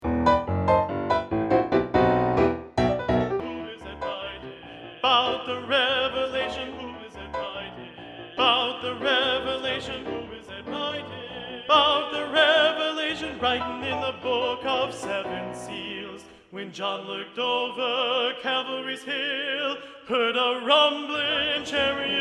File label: Tenor 1 Predominant, choir; advanced SATB honor choir; Practice Track